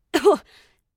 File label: Damage